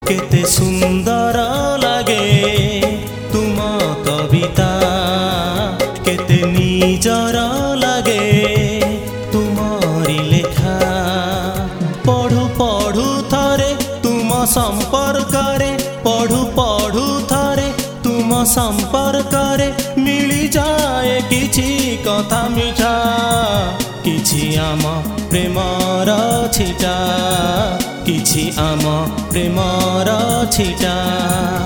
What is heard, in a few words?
ringtone